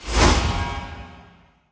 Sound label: Shield; Hero Asra